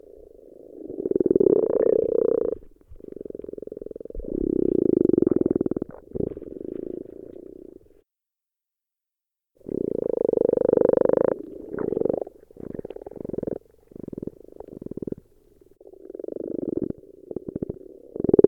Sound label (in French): grenouille rousse